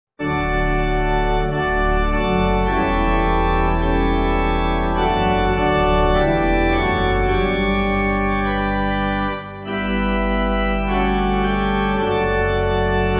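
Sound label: D Pipe Organ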